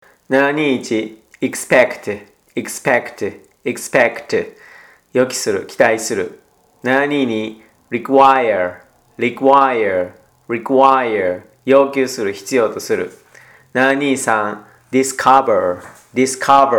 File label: tango